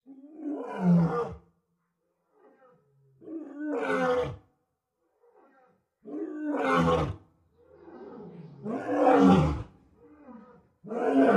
Lion Roar Massive Mul; big cats

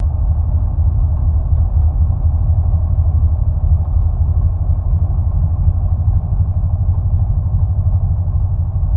Boat Idle Underwater

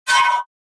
Air Tone Sci Fi, buttons & beeps

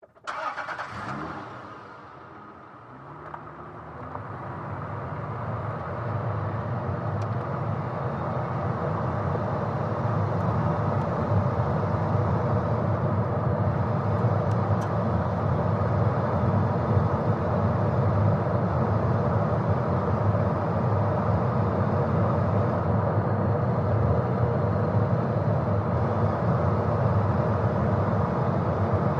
SUV Engine Accele

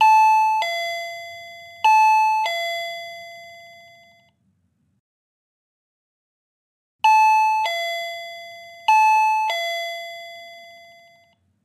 Bells Elect, electrical; Bells Electrical x2

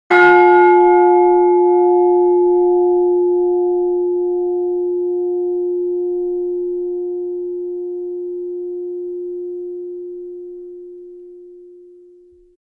Church Bell Single, bell towers

